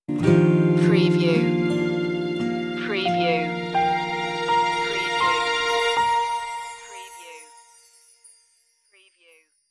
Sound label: acoustic escape stinger